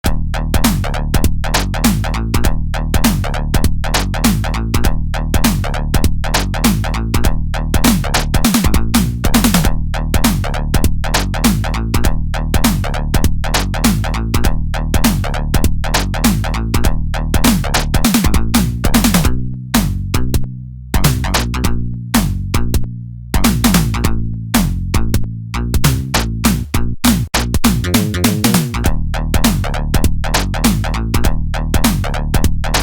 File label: Inventory Bassline; Decap Attack